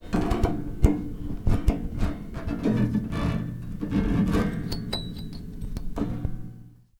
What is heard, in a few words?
Piano Strings Plucked And Scrubbed